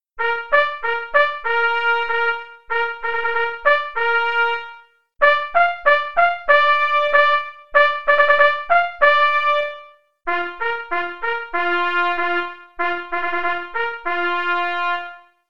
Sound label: Bugle Call Mess (qn=96)